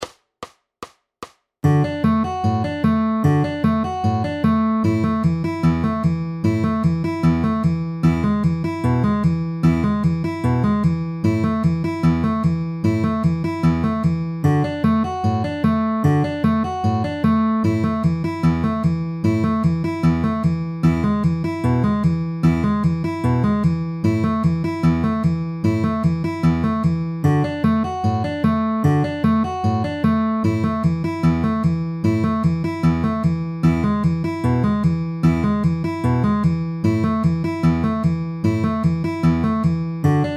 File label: D A E A downward arpeggio ring ring with alternating bass at 150 bpm